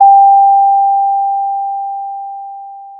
Sound of a doorbell

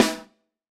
Snr, drum

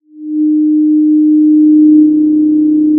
Sound of a cruise ship horn

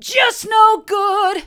just no good hard, vocal

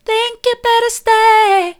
think you better stay soft, vocal

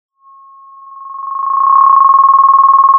Sound of a phone ringing

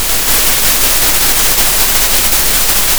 pop, Sound of a thunderstorm

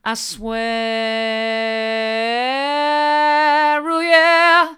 i swear mid, vocal

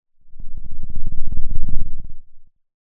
Sound of a lion’s roar

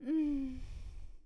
pleasure low; VIVAE

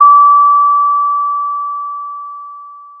pop, Sound of a doorbell

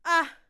fear moderate; VIVAE

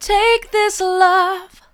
take this love mid, vocal

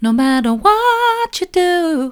no matter what you do soft, vocal

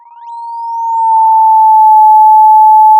Sound of a police car siren

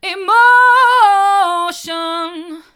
emotion hard, vocal

